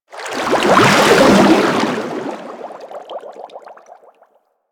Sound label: roll whoosh; Warframe; Vehicles; Sharkwing